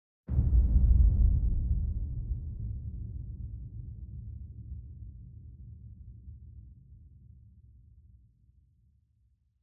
ULTRA LARGE LOW BOOM; ANALOG; WNF ANALOG LIBRARY 06; WHITE NOISE FACTORY

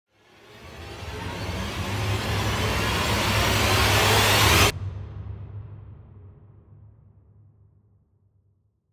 cluster electronic medium rise; ANALOG; WNF ANALOG LIBRARY 21; WHITE NOISE FACTORY

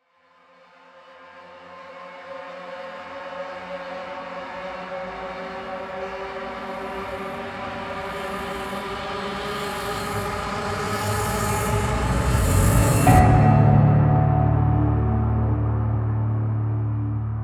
LONG DISTORTED GUITAR RISE AND HIT; WNF ANALOG LIBRARY

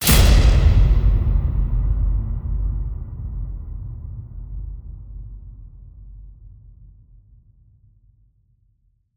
GIGANTIC METAL DOOR CLOSING HIT; WNF ANALOG LIBRARY

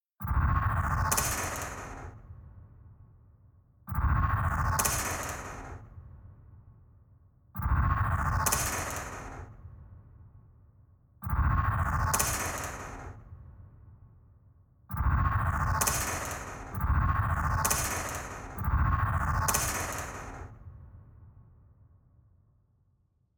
max metal rotor routine hit sequence; WNF ANALOG LIBRARY

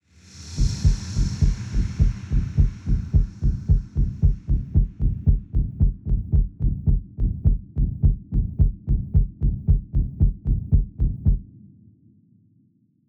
ACCELERETED HEART BEAT; WNF ANALOG LIBRARY

